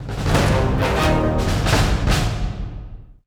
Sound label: dominating